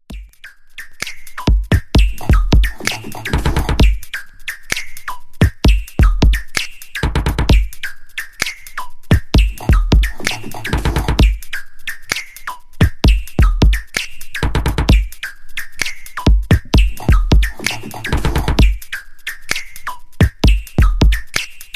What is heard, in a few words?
arp 101